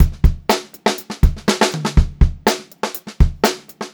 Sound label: Funk-Groove Drumloops, 122 FUNKY, L; USB Soundscan vol.38; AKAI; 90 s Sample CDs